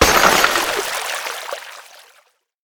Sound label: break; Apotheorasis